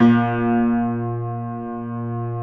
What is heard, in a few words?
A#1 L, Keyboards Acoustic; USB Soundscan; AKAI